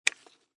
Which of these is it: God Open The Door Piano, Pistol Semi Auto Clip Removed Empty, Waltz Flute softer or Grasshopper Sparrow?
Pistol Semi Auto Clip Removed Empty